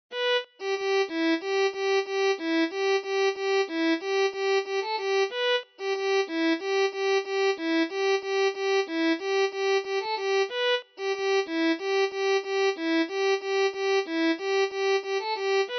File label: Violin